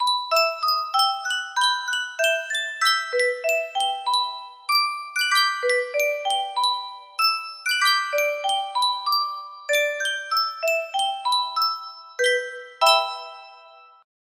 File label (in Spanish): Sankyo caja de musica